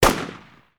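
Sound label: galil single exterior shot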